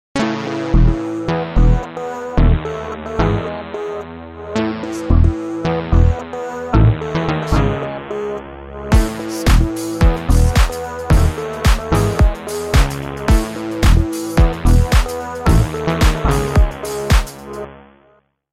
Beat processed; waves

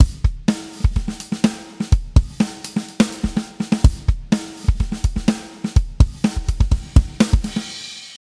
Studio Fill

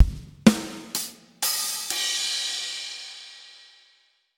Studio Drum Sounds

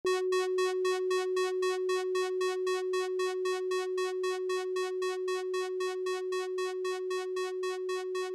modulations

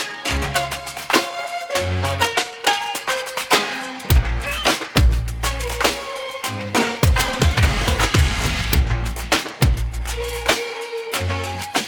trap; Trap beat in country

